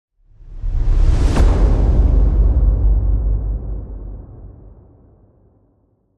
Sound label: Laser Boom